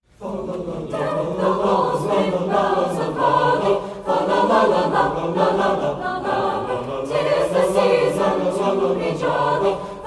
Deck The Halls in 7 8 Sung By High School Chamber Singers; Providence; Deck the Halls in 7/8 (James McKelvy); Arr. McKelvy; Christmas 2025